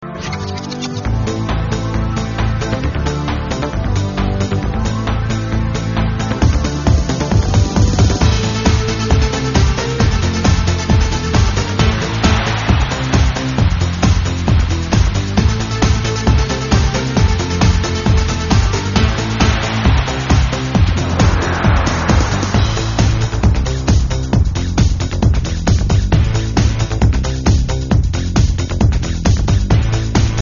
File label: instrumental version